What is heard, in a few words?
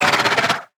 HAMMERHEAD attack